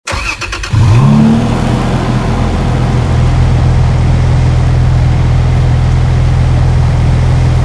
idle, vehicles; tdmcars

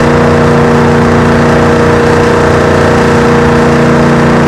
charger low, vehicles; tdmcars; charger2012

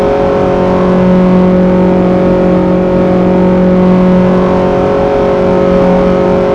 mid, vehicles; focussvt; tdmcars

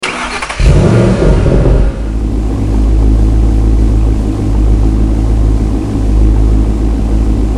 idle, vehicles; hsvgts; tdmcars

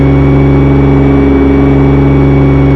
toy fj mid, vehicles; tdmcars

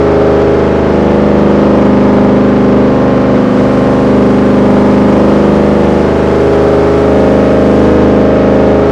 low, vehicles; hsvgts; tdmcars

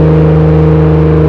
rav4 mid, vehicles; tdmcars

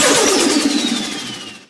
supra blowoff, vehicles; tdmcars

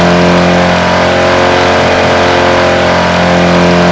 mid, vehicles; tdmcars; mr2gt

